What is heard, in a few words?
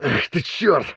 dolg hit; STALKER; customnpcs